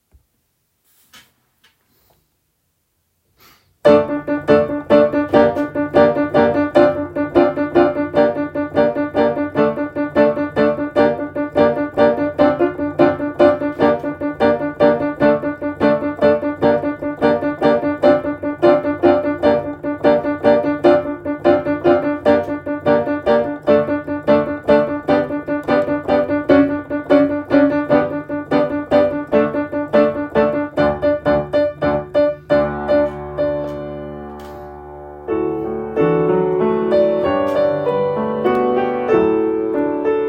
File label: Piano Accompaniment